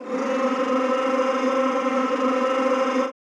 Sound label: CHO C3, Rrrr; Sound & Vision; Gigapack I CD 2; CHO Mmhh + Rrrr